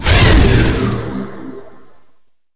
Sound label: Fatal Condition; Seer; GamigoNA